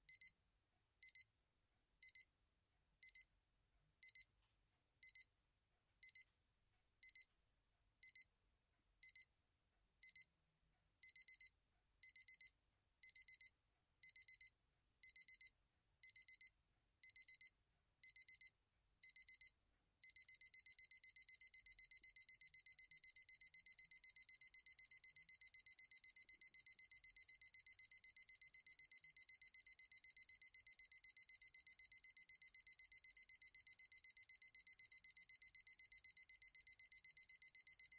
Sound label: meditation timer